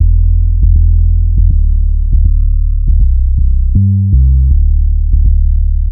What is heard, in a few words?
Jazz